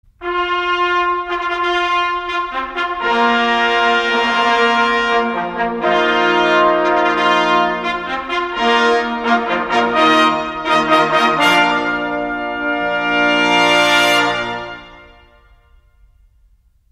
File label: music